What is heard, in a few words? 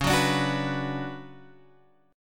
D Major 13th C sharp, chord